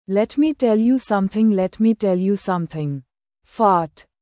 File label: fart